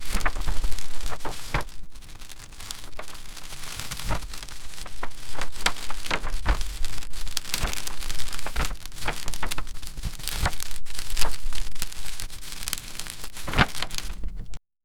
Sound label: light monotone compressed, fire